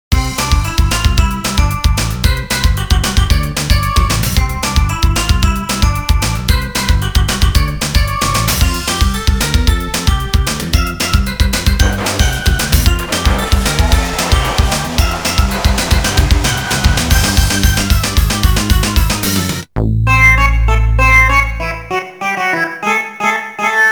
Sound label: Ending, pc engine; World Heroes 2